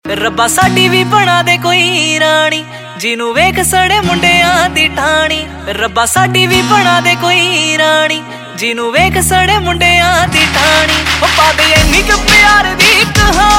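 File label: Ringtone, Punjabi Pop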